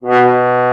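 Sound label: French Horn mf B1; Digital Sound Factory Virtuoso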